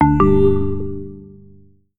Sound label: Message alert